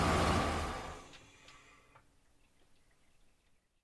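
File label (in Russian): короткий негромкий звук заглохнувшего двигателя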